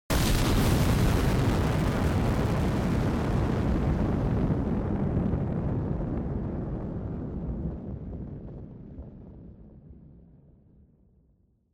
Explosion Long Close

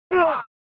SNES Fighting Scream

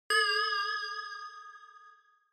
Inspiration Single Low

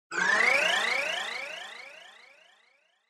Scene Change Long Delay

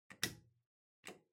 Light Switch Wide Panel, Wall Gentle

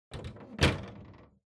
Air Conditioner Panel Close

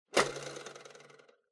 Kitchen Scale Weigh Remove

